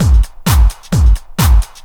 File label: techno, DRUMLOOPS